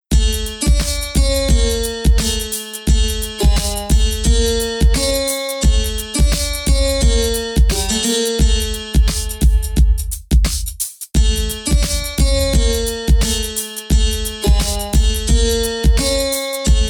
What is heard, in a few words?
sensual rhythm 432 hz; new delhi